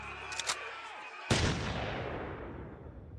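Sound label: Desert Eagle Gun Shot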